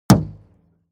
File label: object metal impact deep dull